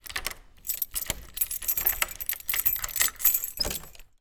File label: household keys unlocking wooden door slight room reverb